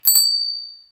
bike bell ringing

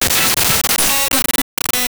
a digital disintegration, dissolve

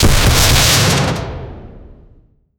special attack

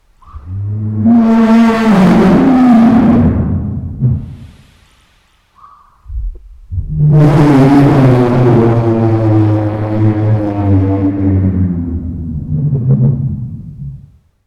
the deep and angry gurgli

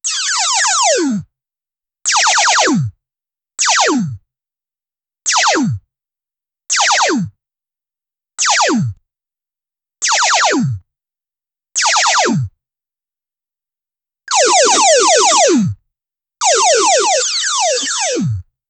create web shooting sound

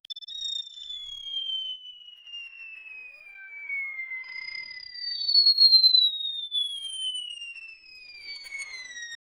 flying alien sound low

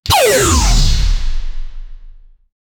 sci-fi lazer hits to defe; defense

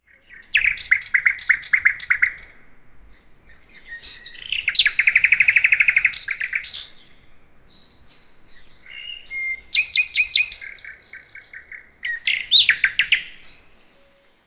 the nightingale bird sing

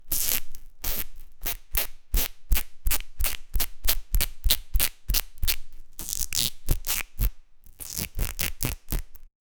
squeezing wet sponge with

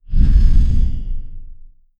smooth air swirl magick e

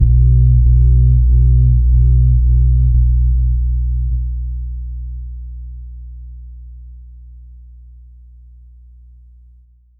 pop bass heavy echo

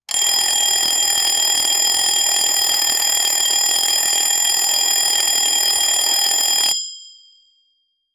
vintage alarm clock stacc

